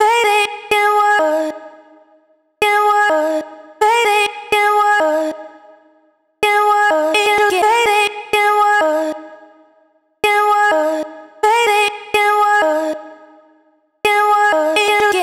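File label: House, vocal chop, 126 bpm, Eb; Slate Digital Omnivox Vocal Sample Pack; Chops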